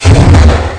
Flame Poof

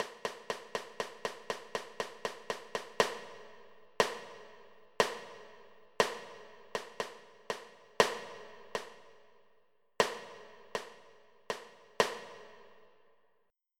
KickQ2 & YamMapleNS; Onj's Soundfont

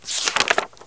page turn

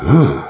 Aah; Army Men TiS

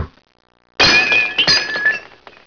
broken

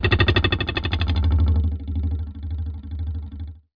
TWANG

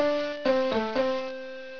Sea Cliff Minimize; mer

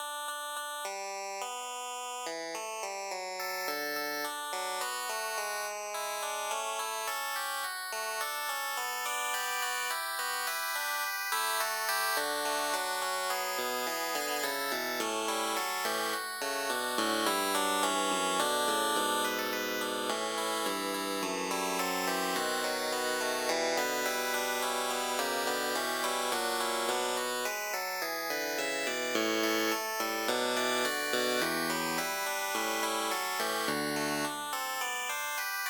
fugue

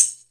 Tambrine; S929 win 98 driver

